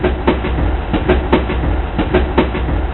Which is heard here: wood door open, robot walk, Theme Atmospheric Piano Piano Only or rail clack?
rail clack